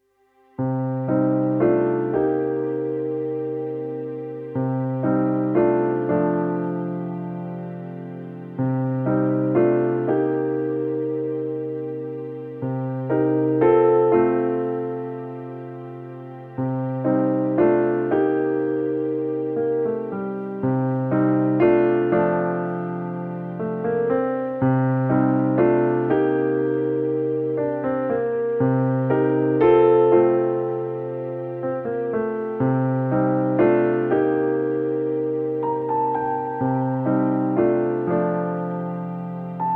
PIANO

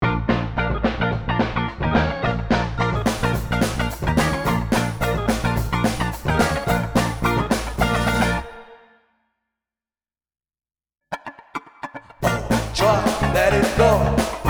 POP MALE SINGER

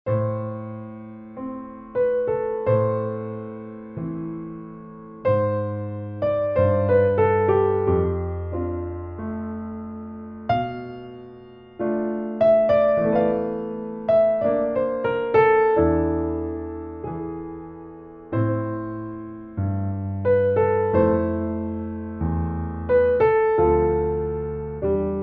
PIANO

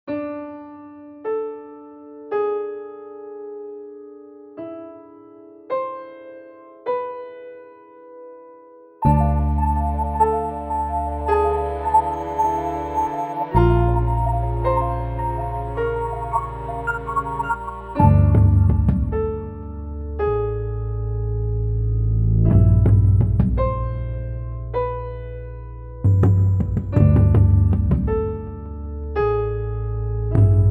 SUSPENSE TENSION

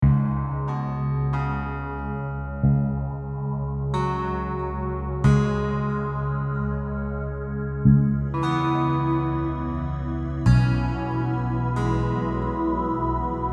ZEN Nature

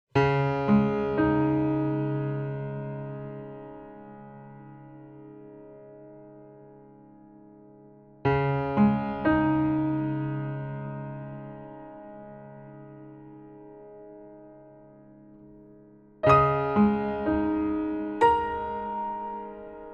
PIANO

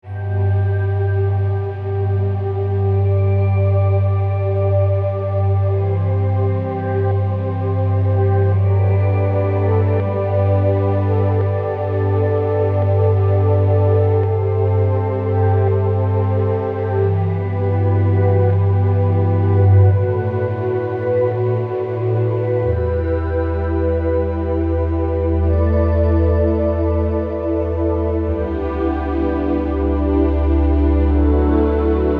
ORCHESTRAL

